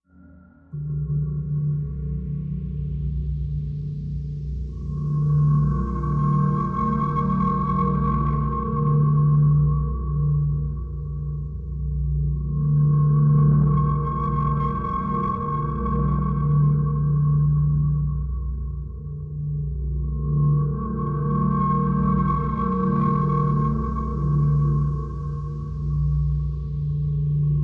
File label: Lost Soul Low Drone High, eerie ambience